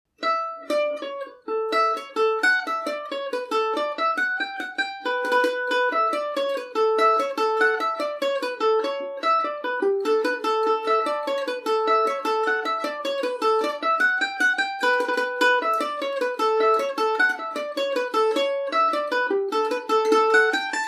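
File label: A mixolydian, Irish mandolin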